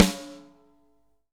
SNARES DRY; Real Mega Drums

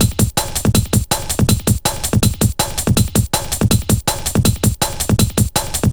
Steel, Jungle Breaks; Jungle Warfare 1&2

